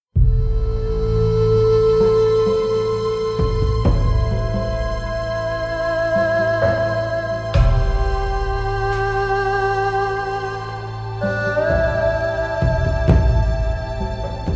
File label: music ambient